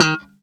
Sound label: eb4 staccato, glowly bass